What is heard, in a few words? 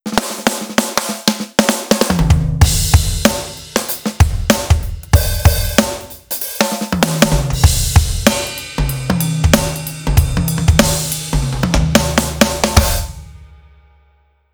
fat funker drum